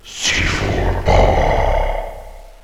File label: planted